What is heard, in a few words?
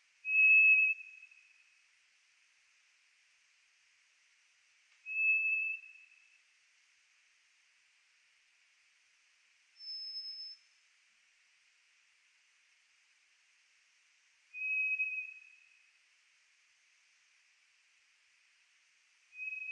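White's thrush; toratugumi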